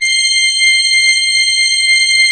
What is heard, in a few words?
C6, Cathedral Organ, MANUAL PLENO; Propeller Island